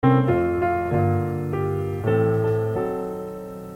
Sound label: O Tannenbaum